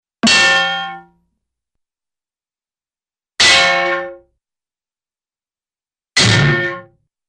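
Head Bonk with bell & Drum Clang with & without Thud, cartoon; Hollywood Edge; Cartoon Trax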